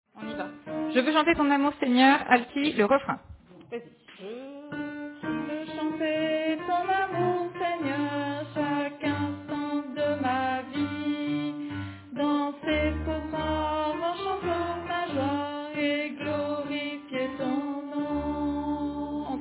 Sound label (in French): Alto